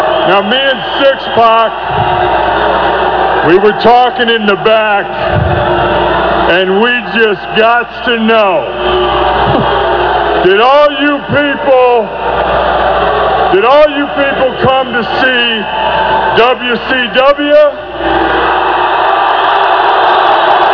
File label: hall